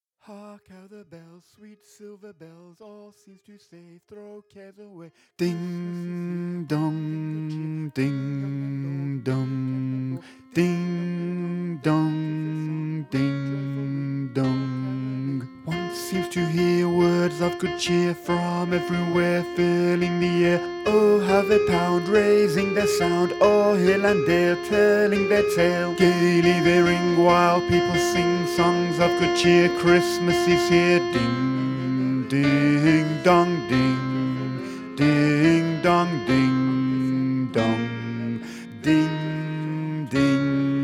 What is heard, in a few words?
alto; christmas; Carol of the Bells